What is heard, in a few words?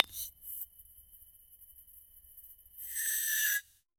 detailed high fidelity fo, mug